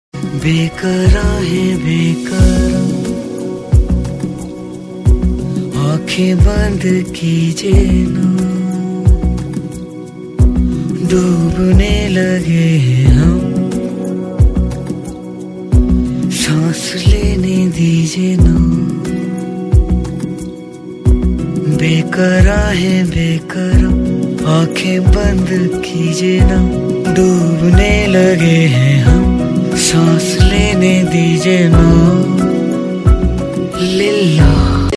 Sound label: Soft Tone; Bollywood; 7 Khoon Maaf (2011)